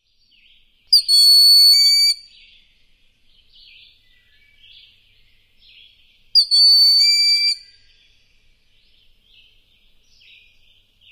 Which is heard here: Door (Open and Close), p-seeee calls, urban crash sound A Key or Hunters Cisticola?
p-seeee calls